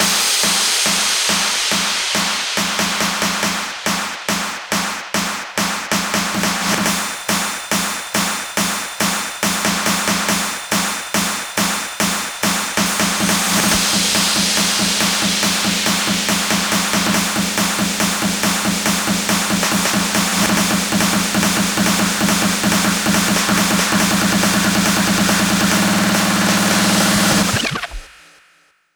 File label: 140BPM 16Bars, HandsUp & Trance Fills; Trikks; Vengeance Samples